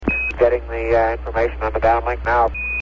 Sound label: downlink, cell phone theme